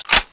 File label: clip in, weapons; cso; turbulent7